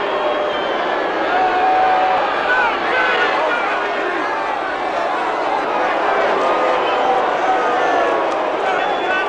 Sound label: Baseball Crowd Boo Loop; Crowds